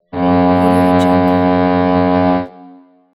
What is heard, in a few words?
boat siren; soberman